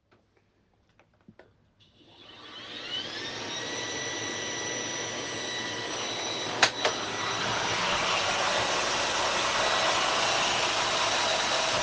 Sauger

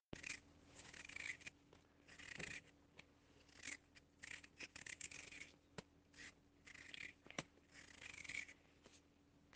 Kartoffeln schaelen

